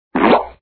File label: bad sound; barbie is hungry